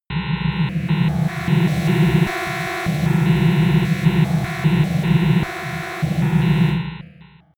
atmosphere on off

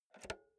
insert jack multi socket

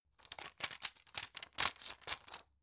plastic blister handle long

